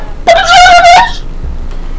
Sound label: turkey